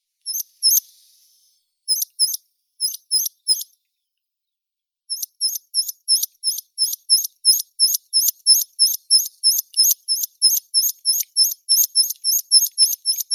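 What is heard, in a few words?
rock pipit, Anthus petrosus; konek skalnii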